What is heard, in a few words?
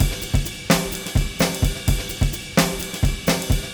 Fusion 128, Beat Loops; Zero-G; Monster Beats